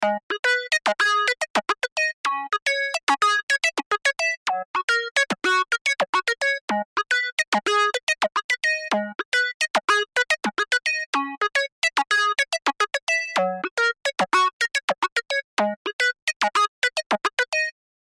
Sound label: Crusher Synth Volume Balanced